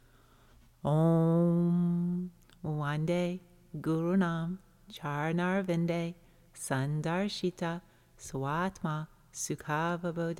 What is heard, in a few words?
Vande Chant